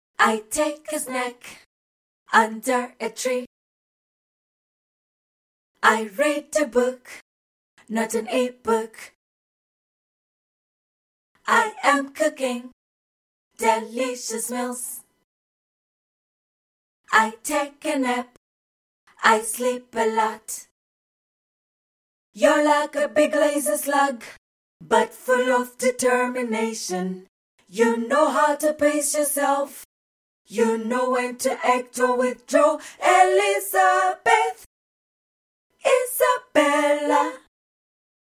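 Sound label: pop 85 B minor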